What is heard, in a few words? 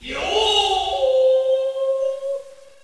drum